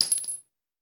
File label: Bullet Shell; Sound Ideas; Guns